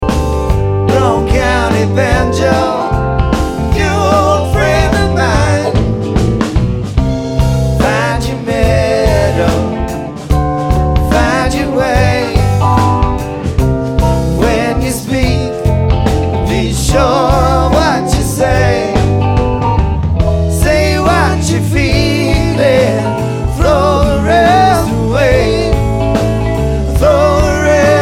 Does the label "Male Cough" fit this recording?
No